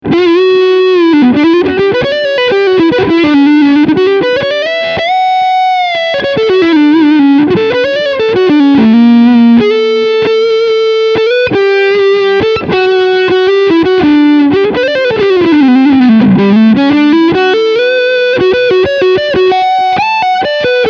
Overdrive Front HB

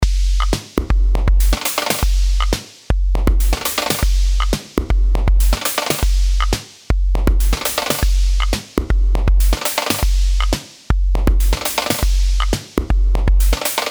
DrumComputer

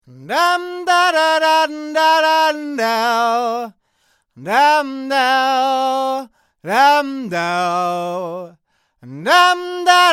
Audix SCX25 S2 far

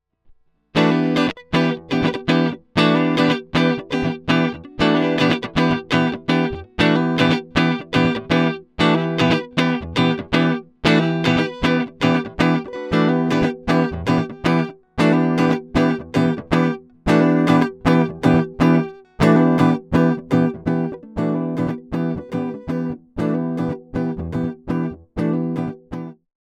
Strat passive pure Z Tone Sweep; IK Multimedia Axe I/O Solo

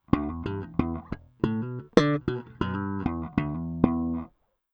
Slap Midrange

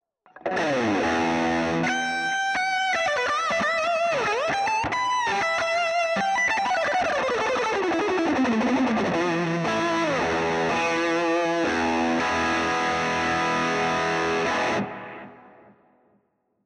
Crying Lead

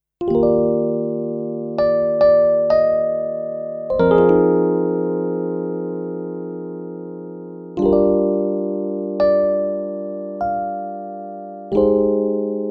Kawai ES120 E-Piano

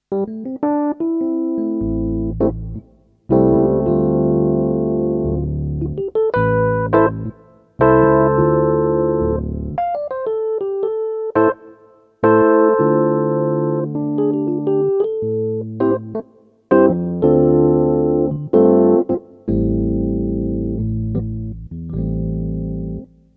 HP504 EPiano

